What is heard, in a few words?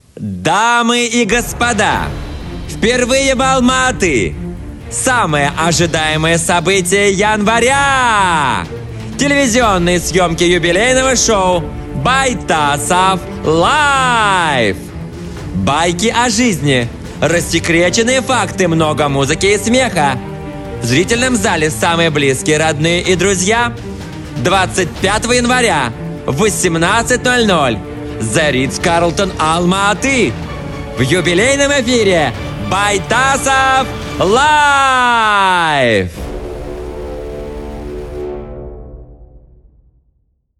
music